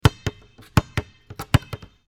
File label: Basketball dribble